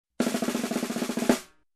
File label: Snare Roll Tension Build Up